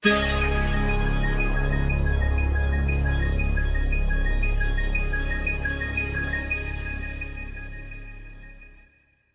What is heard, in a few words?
Music, 片头音乐; 特酷声音素材库